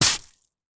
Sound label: person kicks slushy ice on pavement